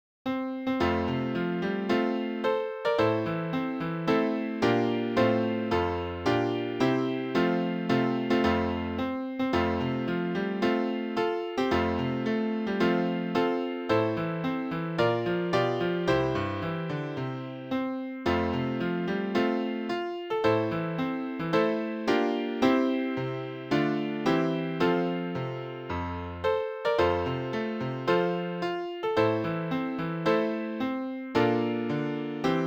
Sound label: piano